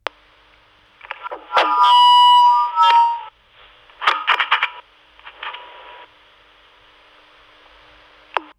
Walkie Talkie, Kenwood TK-3107 FM, Radio Communication, No Speech, Static, Feedback Squelch; Manipulations; Sergey Eybog; Handheld Tranceivers